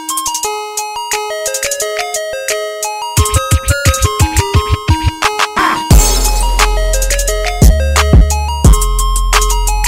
icecream beat

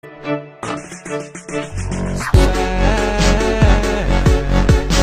anticipation music

